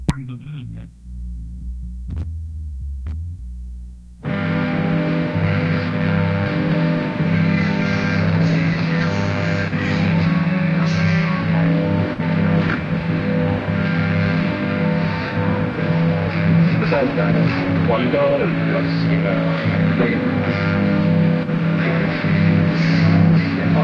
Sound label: steady building of noise, event